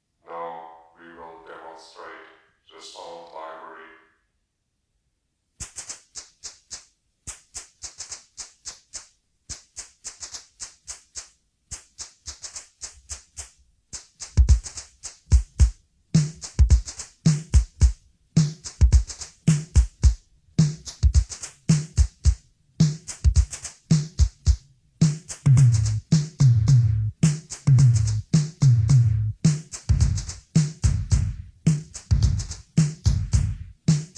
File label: Rhythmic patterns; SONOR; Mammut Sounds Sound Library